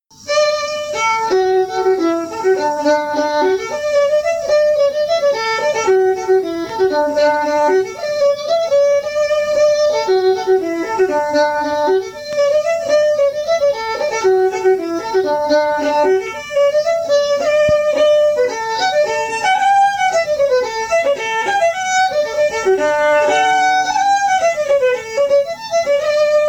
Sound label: fiddle; SeamusGrantFestival; tune tutor